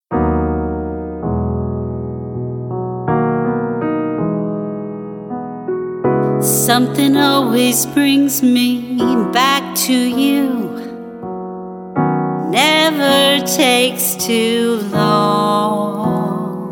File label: SINGING